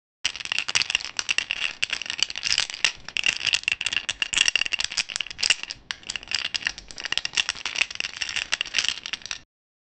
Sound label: deep plastic snap firm, lego